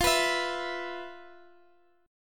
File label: E 5th F; strummed; chord